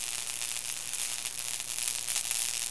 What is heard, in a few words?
electric; de area51; cstrike